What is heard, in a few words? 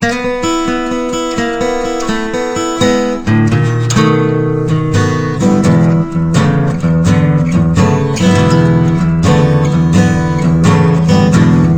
Solo Guitar Mississippi Blues; Rage; Westar